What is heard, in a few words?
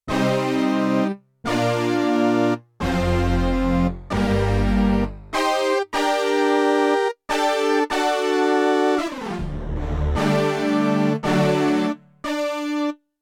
Keybrass 4-Voice; Yamaha TX16W; Orchestral Performance 1